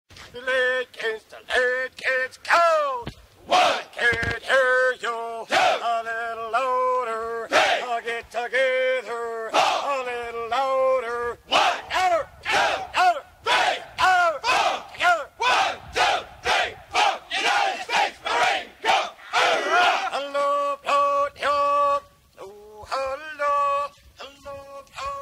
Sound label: Marine Corp Cadence